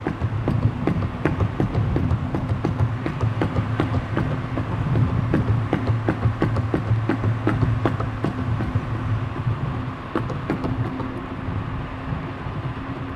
Car Tires Asphalt; Speed Bumps, Close On Board Perspective 2x